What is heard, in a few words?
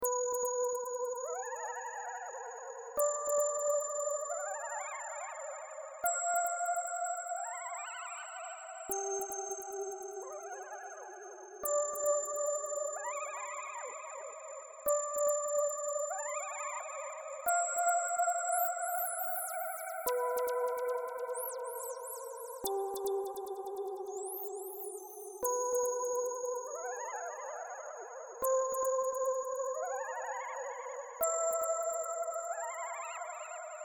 Random Synth Sounds